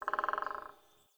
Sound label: Bird Woodpecker; Ambience; Warframe; Nineteen Ninety Nine